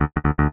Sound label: Loose Strings; Morphine